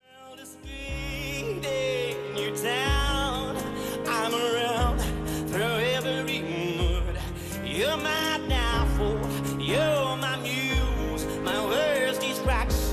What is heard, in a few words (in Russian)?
Голос